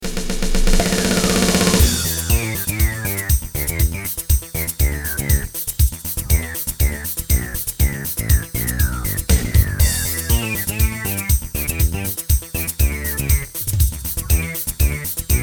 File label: Pop; HyperCanvas